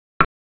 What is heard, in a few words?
clock